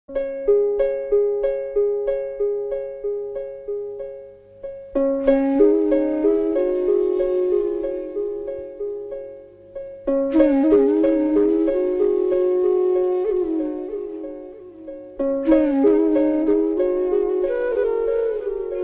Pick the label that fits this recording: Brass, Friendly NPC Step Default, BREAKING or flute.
flute